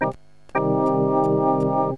MINOR, ORGAN; KEYBOARD; cubase; CUBASIS

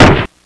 HAND GUN; JOSEPHIN

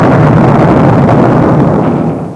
BOMB AWAY; WAR

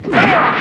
FXSWOSH; DRUMS; cubase

